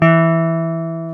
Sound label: Jazz Guitar; Club-50 - Foundations; Jaz-Kessel